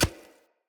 Short Sword Medium Impact Textile; Weapon Impacts; Player; Swords; Environment

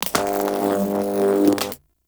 Electric Step; Enemies; Steps; Warframe; Eidolon; Teralyst

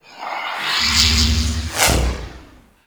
Doom Sword Idle Whoosh; Excalibur

